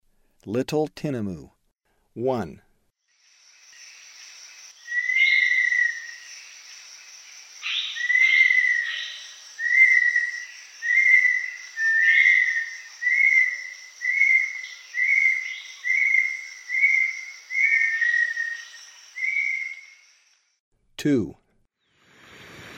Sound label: Little Tinamou; S. America; Eastern Lowlands